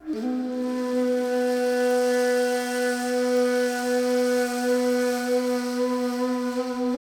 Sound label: SIERRA FLU